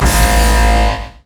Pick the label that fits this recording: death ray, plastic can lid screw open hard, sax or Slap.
death ray